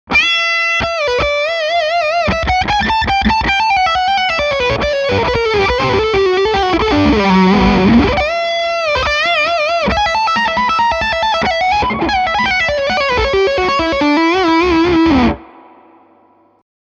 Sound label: Leadline High Gain